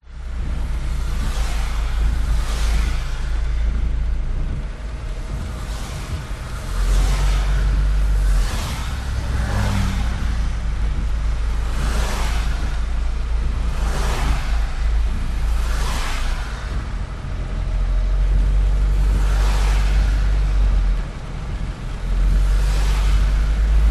car drive slow traffic jam rain windows open cars pass fast outside; transport; opp side